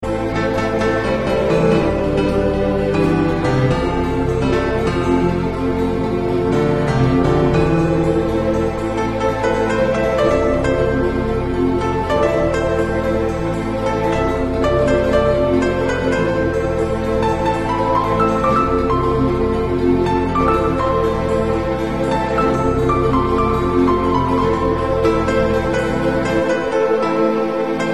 Instumental

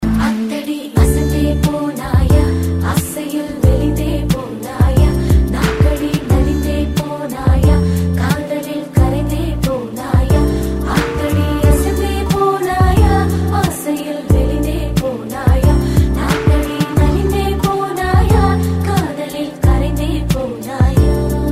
Chorus

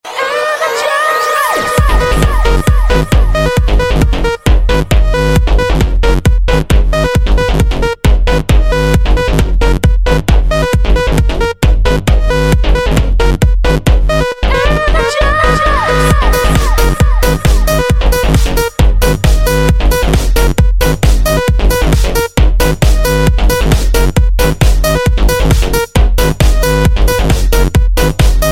Mix Energize House

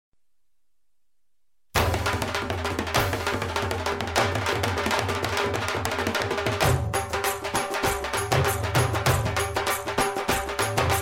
Bhangra

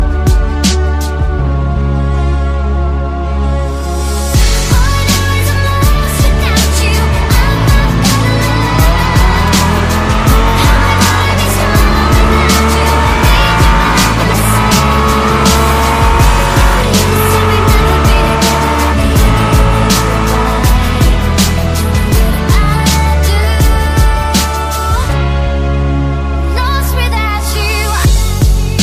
Suzuki Moto

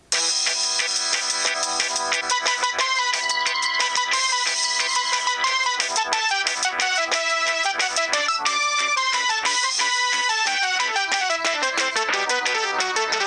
Upbeat

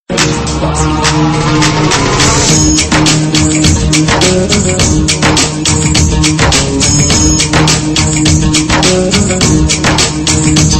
Dhol Mix

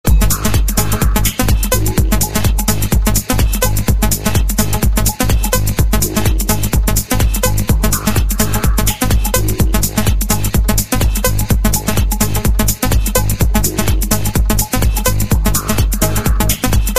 Music

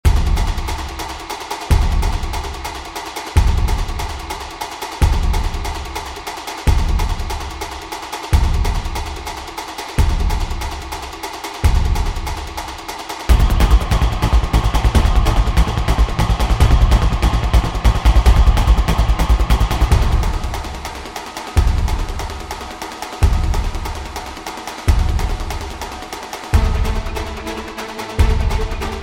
Inception Beats

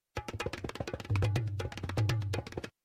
Dholak Roll

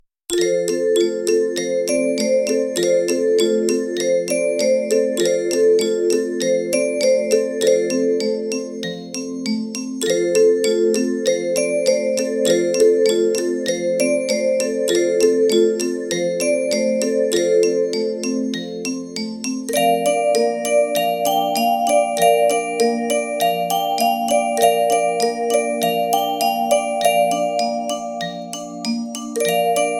Raindrops

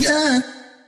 UNH

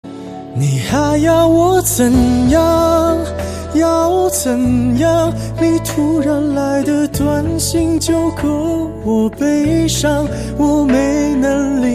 Chinese pop song

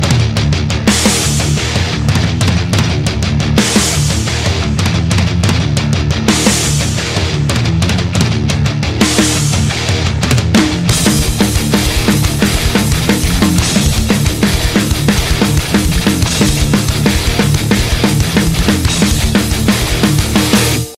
Bass guitar drums

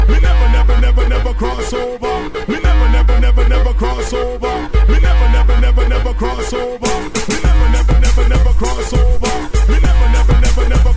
Very Cool Techno

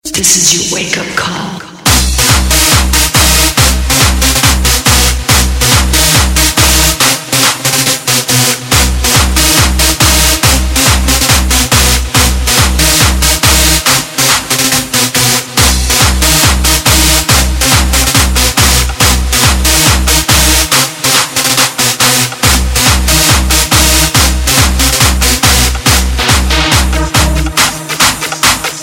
Hard Dance

